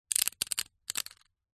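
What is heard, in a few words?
leisure poker chips stack quickly in hand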